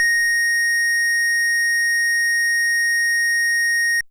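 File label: FM Sq Dist DX100 A#1; square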